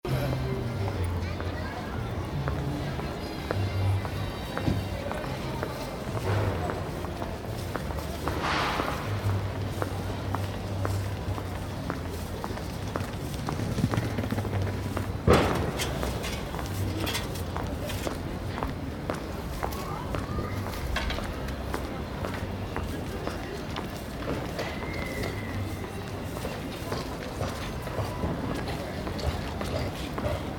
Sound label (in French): sol urbain fond metabolique